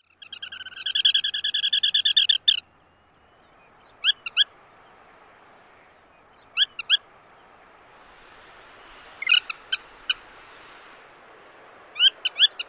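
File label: flight chatter and other calls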